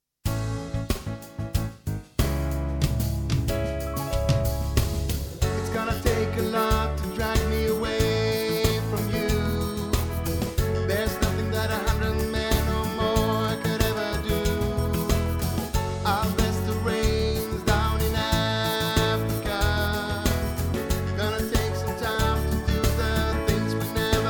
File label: Bas